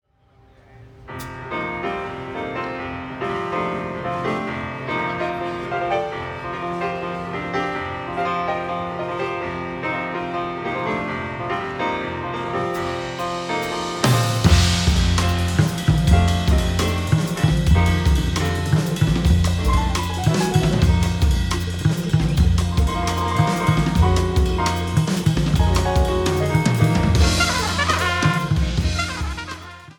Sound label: trumpet